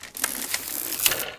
charger pump; garrysmod; metro

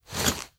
ladder, footsteps; garrysmod; player

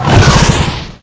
ignite, creature nosalis; garrysmod; metro

